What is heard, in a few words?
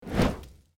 Lava Awake; my cute commissar 2020 gamerip